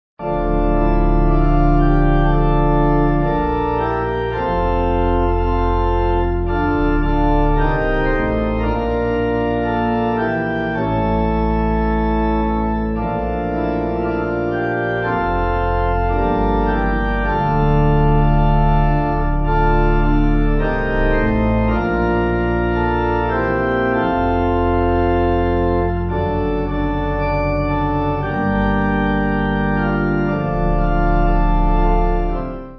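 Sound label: Pipe, hymn backing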